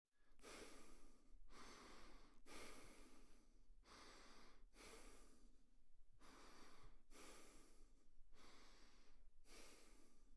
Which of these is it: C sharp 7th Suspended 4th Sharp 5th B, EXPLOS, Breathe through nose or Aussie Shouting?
Breathe through nose